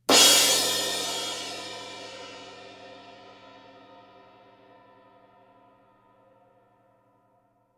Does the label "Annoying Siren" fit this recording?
No